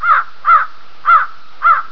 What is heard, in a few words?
bird crow; creatures; birds; mono